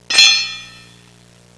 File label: TOOL DRO; Easy Personal Web Page